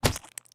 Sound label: Body Head (Headshot); SNKRX